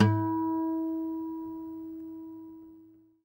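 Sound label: harmonic, Guitar; Quantum Leap; Guitar & Bass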